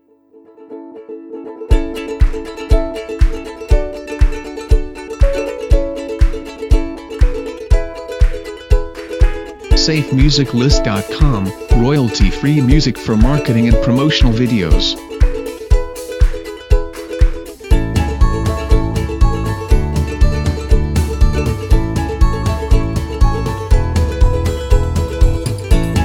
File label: Happy And Upbeat; SafeMusicList